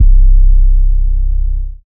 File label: 808 Lick; 808 volume 1; SOUNDEALER